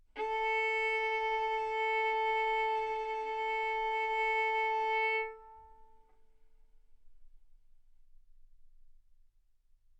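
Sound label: Violin arco mf A string A4 B4 stereo; sul A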